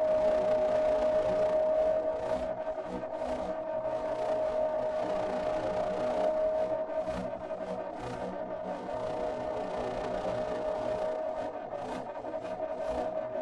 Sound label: static holo sign; angel city; Titanfall 2